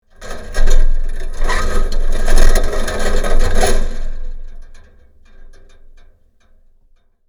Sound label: Metal gate rolling sliding; squeak sound effects